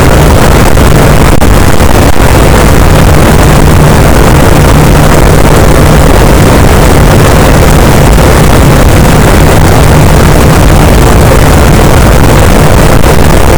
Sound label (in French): cachalot negatif, boat, Physeter Macrocephalus